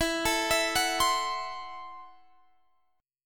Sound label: C 6th Flat 5th E